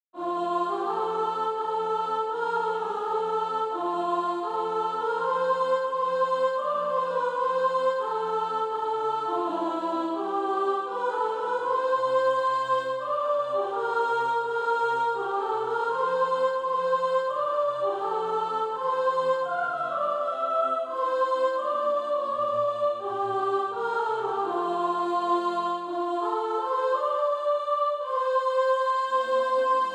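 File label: soprano, SATB Practise Music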